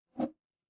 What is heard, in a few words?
ui swipe